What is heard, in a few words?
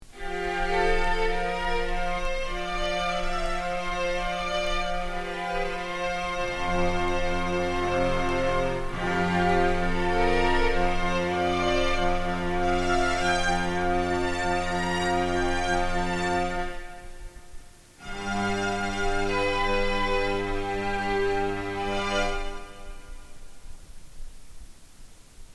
Swan (violin)